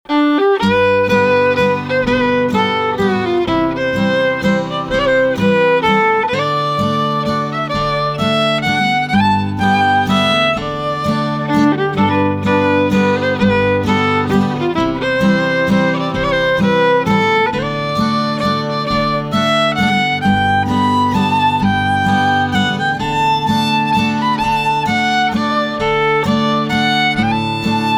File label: Waltz; Kiss Me Waltz